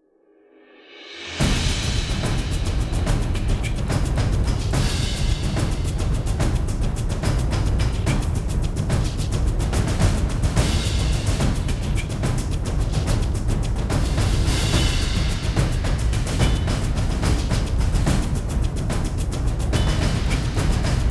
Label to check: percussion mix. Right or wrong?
Right